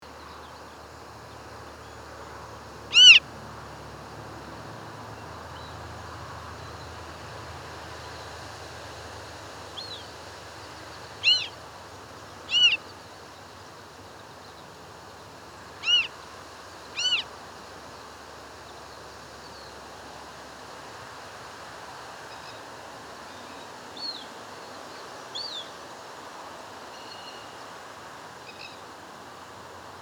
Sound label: Circus Approximans; Swamp Harrier